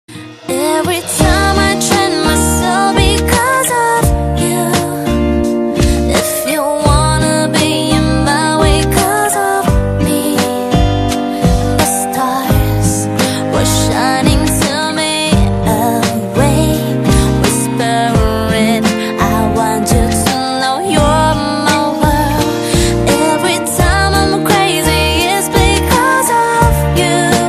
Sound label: Guitar Ver